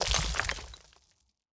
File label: environment block upgrade stone